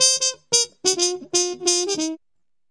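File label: 177 bpm acid jazz trumpet line; HORN LOOPS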